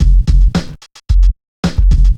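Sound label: Loop Home Phrase 110bpm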